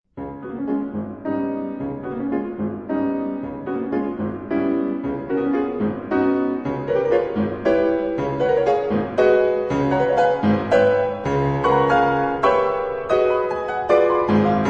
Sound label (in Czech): Credo Fis moll klavirni verze